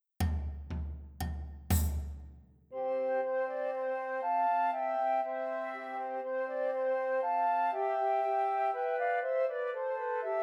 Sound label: Trios for Three Descant Recorders